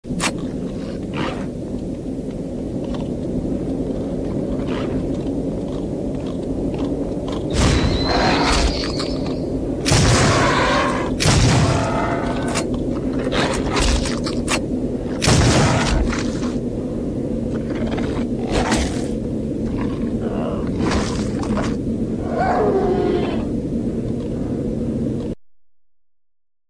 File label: Shotgun; Howlers; undying